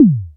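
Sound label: electronic tom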